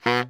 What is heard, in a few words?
BARI FF B 1, Sax; Giga Samples Collection; BARI STEREO